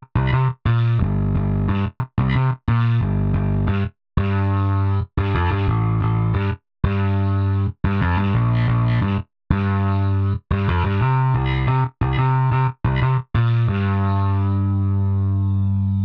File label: Bass Bounce; Pro Tools Essential Demos; Digidesign